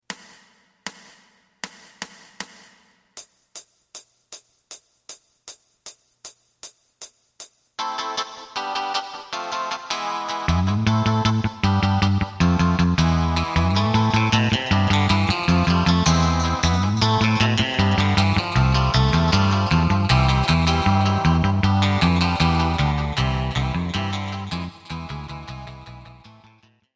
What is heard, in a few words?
drum